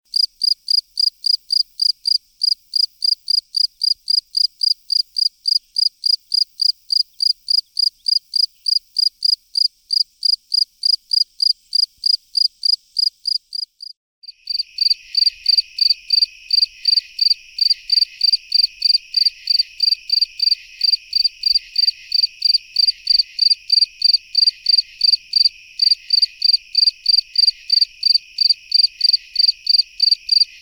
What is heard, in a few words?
Fall Field Cricket daytime then night